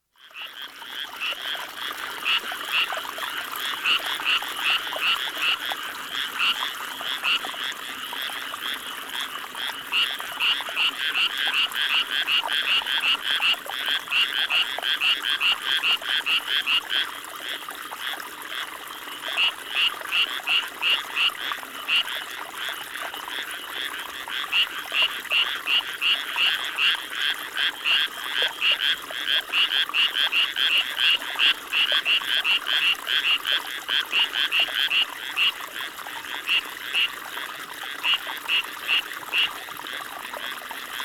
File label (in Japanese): カエル